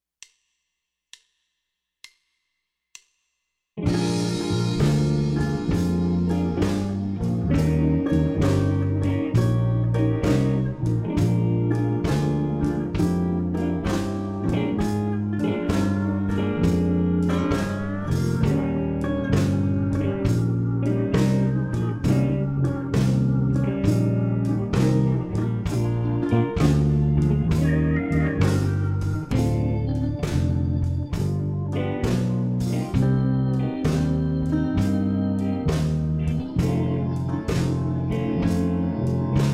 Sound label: Bb Blues BASS play along